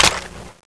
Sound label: box out, weapons; skull8; cstrike